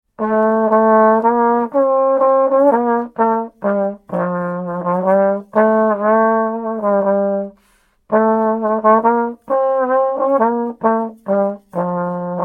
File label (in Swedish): Trombon; 16 bit